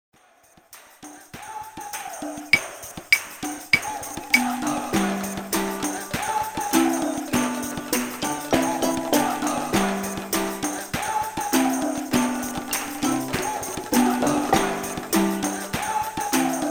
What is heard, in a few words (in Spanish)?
Ritmos africanos